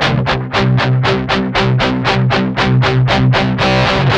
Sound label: 8TH C#3, Guitars; Spectrasonics; Hans Zimmer Guitars VOL-1; 128-JACKHAMR